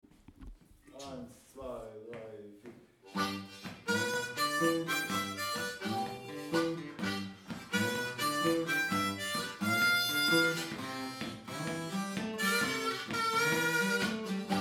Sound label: rumba blues